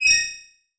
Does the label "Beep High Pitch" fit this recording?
Yes